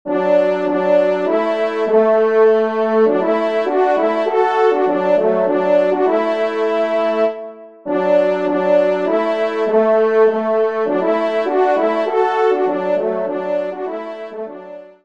Trp DUO; Leve Dieu; Messe d'Estival

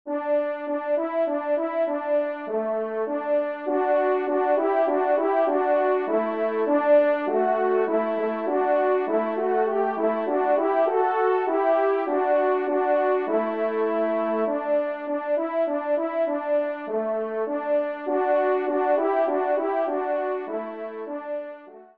Menuet Do maj, trompe; 1e Menuet; Corrette

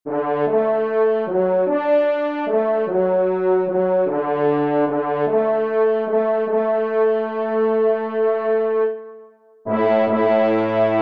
TRP Bass; Sorbiati La

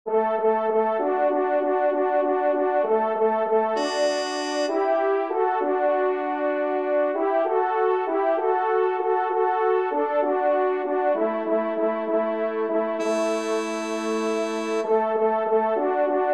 Fanfare Do Maj, trompe; 2e Fanfare; Corrette

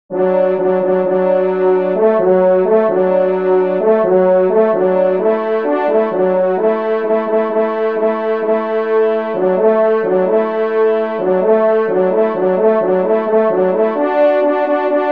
marche, trompe; messe d'estival

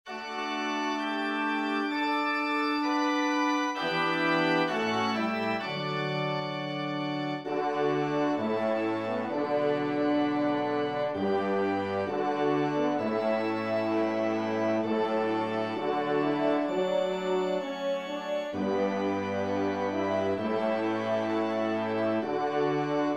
Communion, trompe; Devert; Communion 1e Messe

